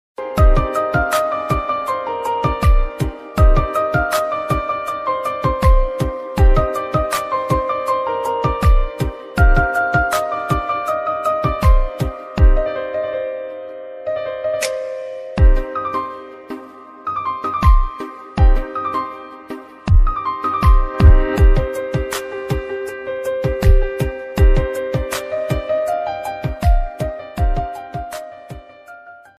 new punjabi ringtone new punjabi love song ringtone punjabi sad song ringtone